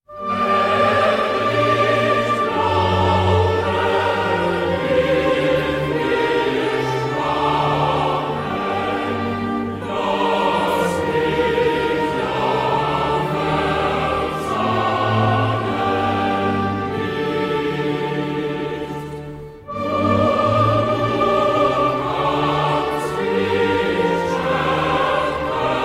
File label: Cantata BWV 78 Chorale